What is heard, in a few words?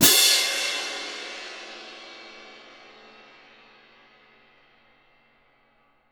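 CYM F left, cymbal, percussion; Miroslav Vitous - Percussion & Harp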